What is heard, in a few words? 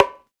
Congas; African Contemporary; Spectrasonics; Supreme Beats